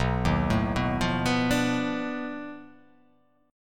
B 7th Sharp 9th Flat 5th B down

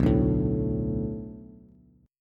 B Minor 7th B Bass, chord; Bm7; strummed; x,2,0,2; JGuitar

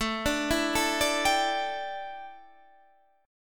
D Suspended 2nd Suspended 4th A

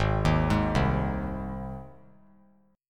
G sharp Major G sharp, chord; arpeggiated; JGuitar

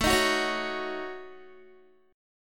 A 6th Flat 5th, Guitar; A6b5 strummed

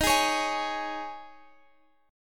G sharp Suspended 4th; x,x,13,13,14,16

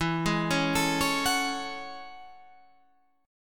A Major 13th, guitar

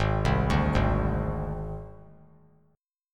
G sharp Major Flat 5th; G#Mb5 arpeggiated; JGuitar

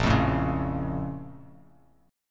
A sharp Minor Major 7th, down; A#mM7 strummed; JGuitar

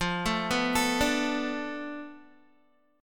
F 6th Flat 5th F Guitar; arpeggiated; chord; 12,12,11,14,14,x; JGuitar

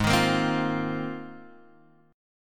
Ab 6th Add 9th Ab, Guitar, Open G

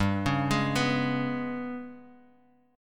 G sharp Minor Major 7th Double Flat 5th

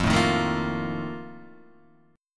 F 11th, Guitar, DADGAD

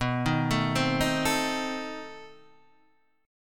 B 7th Flat 9th B Guitar Open D

